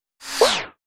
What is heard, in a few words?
Spinner